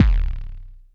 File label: BD, Bassdrums, Roland TD-20